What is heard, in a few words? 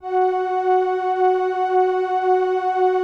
LAMBDA, String Machines